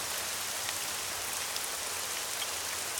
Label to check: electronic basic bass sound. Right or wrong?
Wrong